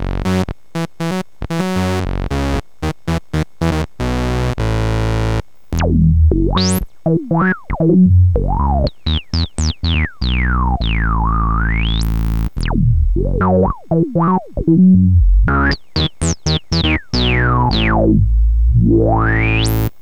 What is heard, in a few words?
VCF 24db LP